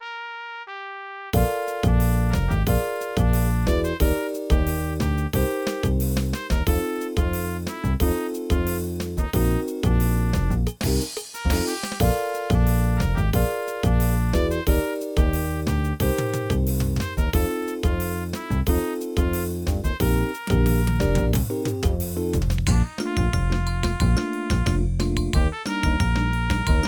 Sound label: Silver Brazilian Bells midi Slow Bass Up